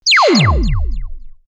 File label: Zap, synthfx; X-Static Goldmine